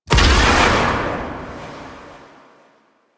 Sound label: Blade Explosion; Warframe; Pax Duviricus